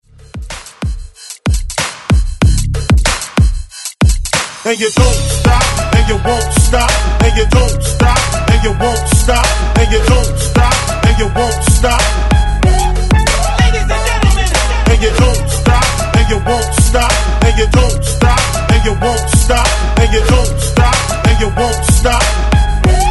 Hype Rmx Bpm 94

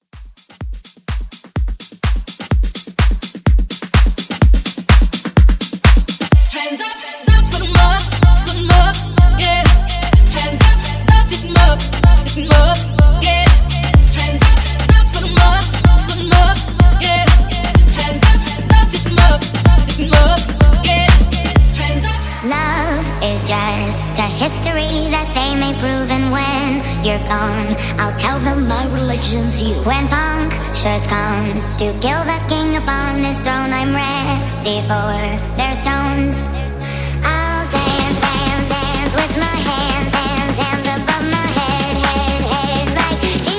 Hype Redrum; Remixfreakz

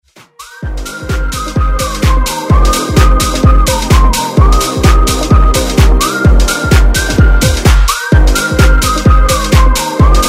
House Redrum

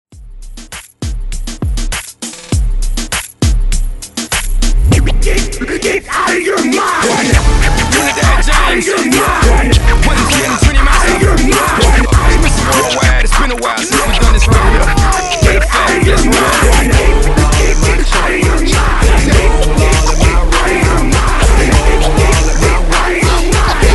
Acap Loop 100 Bpm; DJDOLLS

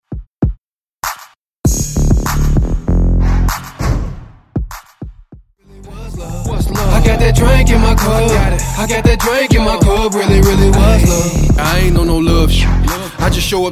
Extended Redrum

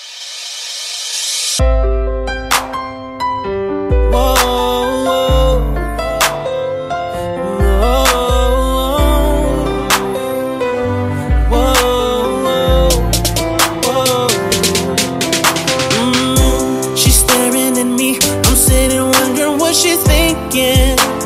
CLAPAPELLA R B

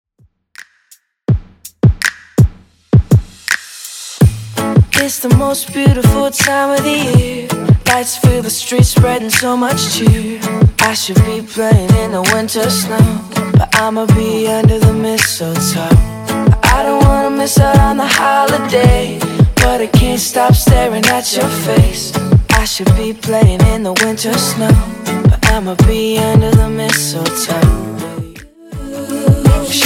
Christmas Redrum 82bpm

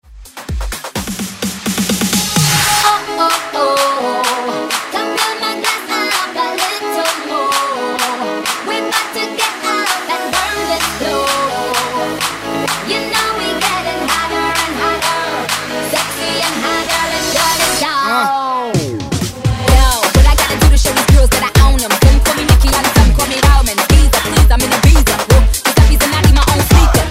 Afrobeat Redrum

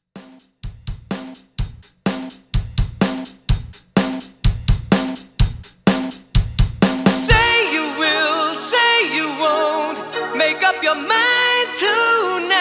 80s Rock, 126 Bpm; ReDrum

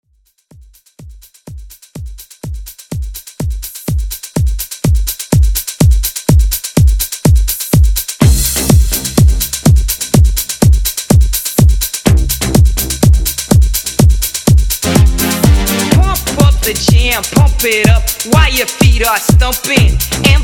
90s Redrum

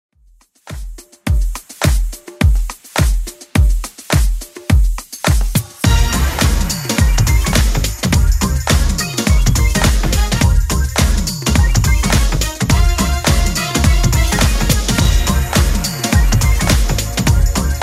80s Club Edit 105bpm

